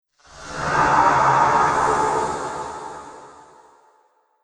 Sci-fi Monster Laser Lizard, alien

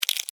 Insect, Eat, Bite, Bug; Insect Eating Sound Effects; Insects Eating (Sound Effects:Animals:Insects); Blastwave

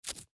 Bandage Peel Off, Bandage Peel Off Skin Rip; First Aid; Hospital; Emergency

